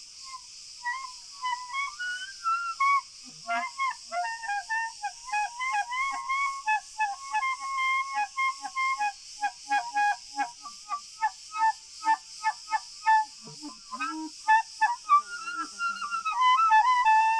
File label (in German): Grillen